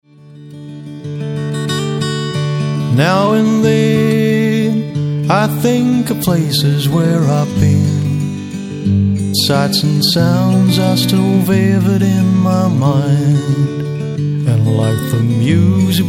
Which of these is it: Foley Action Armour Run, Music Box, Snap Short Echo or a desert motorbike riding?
Music Box